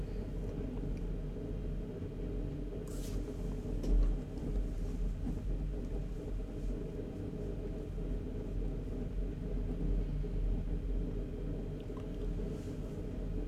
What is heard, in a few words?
Seagate Ironwolf Pro 20TB Hard Drive Noise; ST20000NT001; QNAP